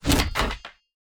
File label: construction repair